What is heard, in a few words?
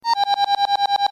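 sms received, iphone os sounds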